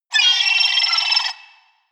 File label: seagull call squawk